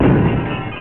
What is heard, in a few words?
explode; super mario paint